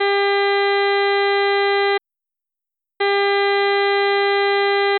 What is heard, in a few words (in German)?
Ein Cent bei 400Hz